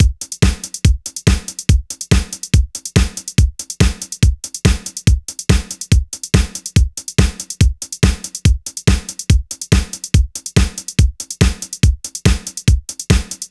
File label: Electro loop; Reference Beat